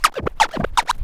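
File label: SCRATCH FILLS; AMG Megabass REMIX! VOL-1 & 2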